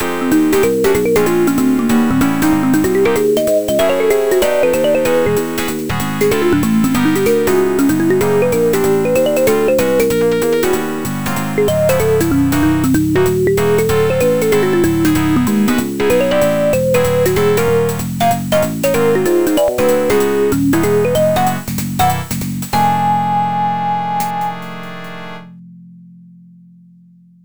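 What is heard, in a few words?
jazz jingle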